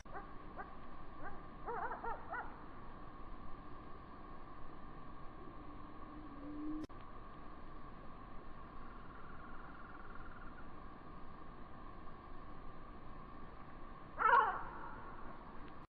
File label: woodpeckers